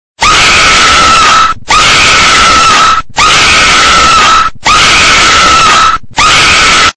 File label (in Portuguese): grito; exorcista